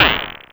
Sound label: Snare, Nord Modular Drum Samples; Yawgmoth